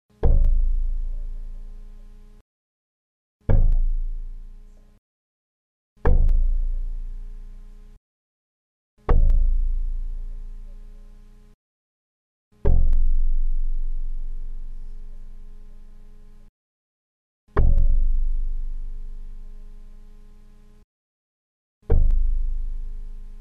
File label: bows damping